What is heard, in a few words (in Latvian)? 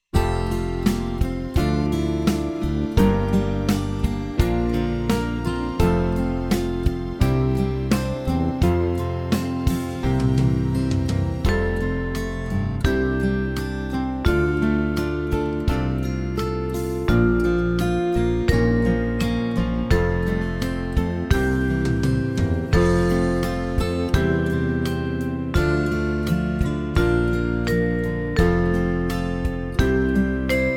Sapnitis in F, Mežrags, Notis un audio dažādiem izpildītāju sastāviem; Demo ar melodiju